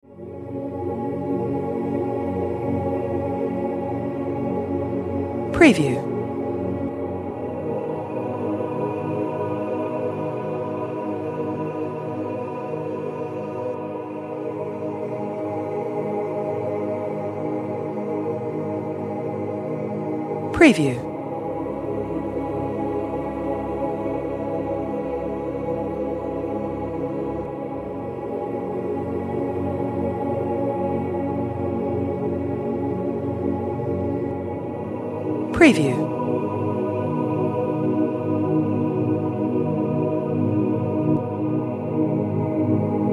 Ambient Music Relaxing Calm Chillout Meditation Space Background